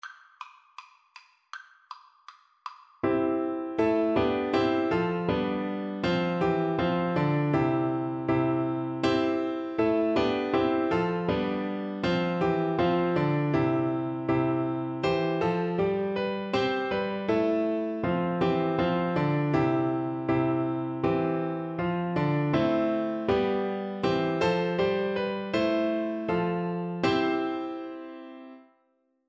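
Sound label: good king, glockenspiel, Percussion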